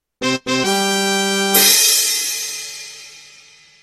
fanfare

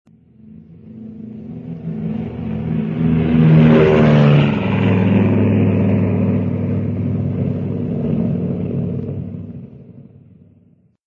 airplane

